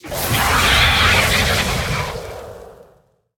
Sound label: Fire Hawk Death